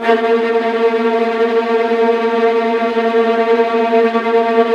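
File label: VIOLINT C4, Synclavier Strings; ILIO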